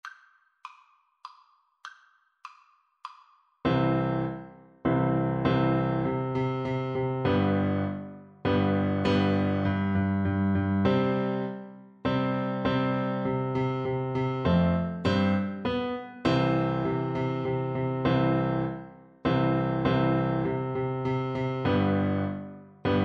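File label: fanfare karaoke, trumpet; corrette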